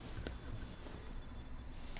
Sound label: beeping more beeps